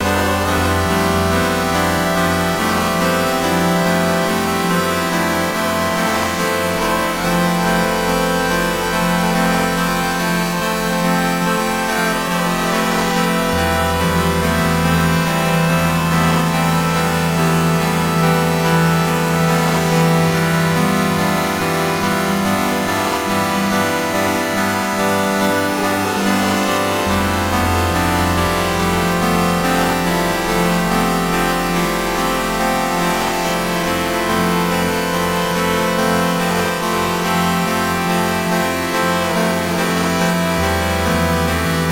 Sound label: AMB LEVEL DnDnDn; super time force ultra